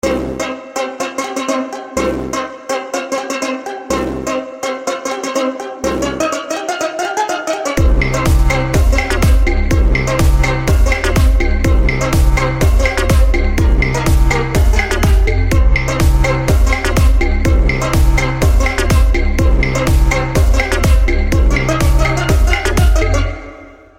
Ringtone for Android Mobile

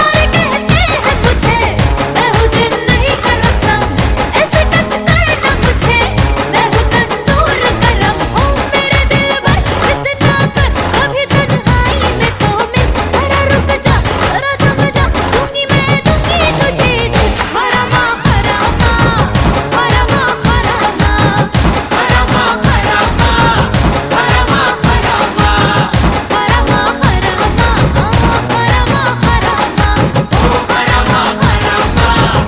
Hindi Item Song Ringtone

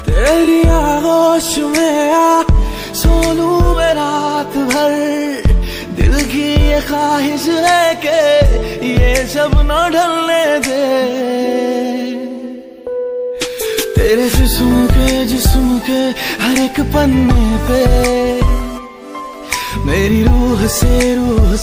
Romantic Hindi Song Ringtone

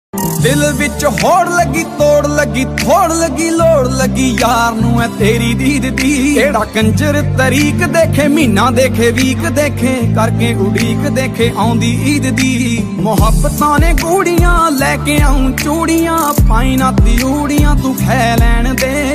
Punjabi Sad Song

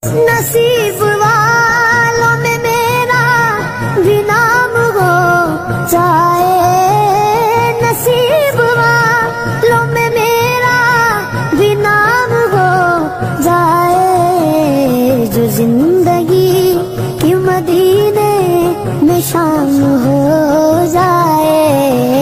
Naat Ringtone

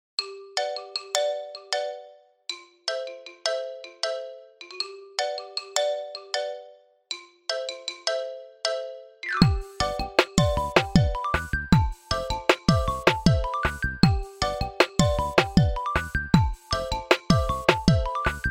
Samsung Galaxy S10 Dynamic Ringtone; ringtones

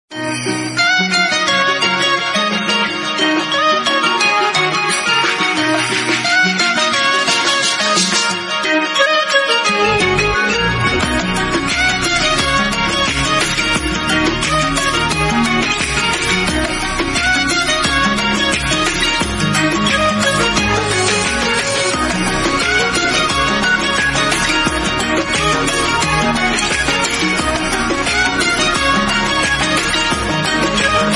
Tamil Music iPhone Ringtone; ringtones

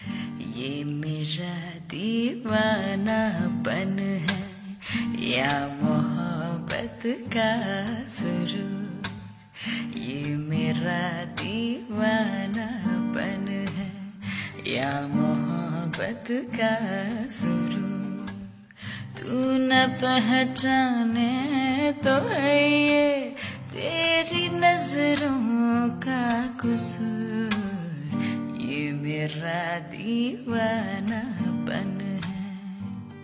Romantic Hindi Ringtone

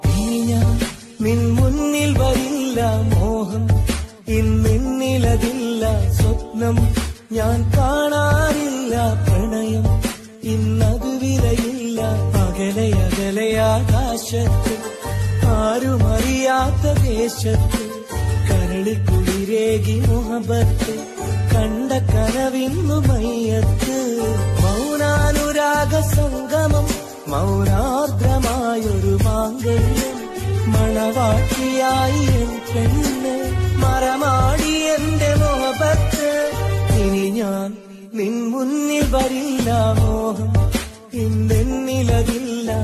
Malayalam Romantic Ringtone

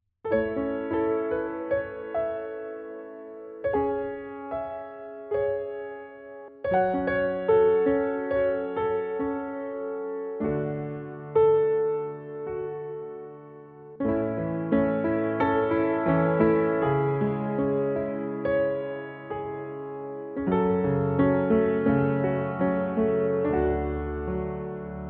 Winter Alarm Ringtone; ringtones

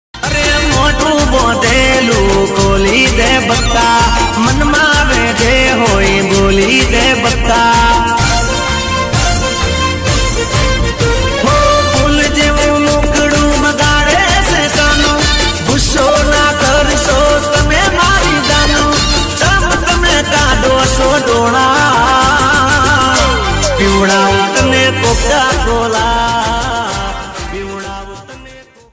Gujarati Love Song Ringtone

